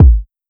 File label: Kick Fat, 808 Kicks; Slate; Vintage Drum Machines Volume 2 808 Edition